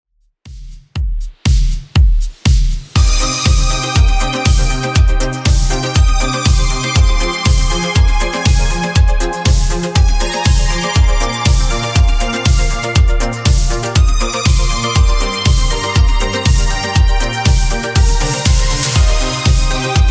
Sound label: 80s Redrum 120 bpm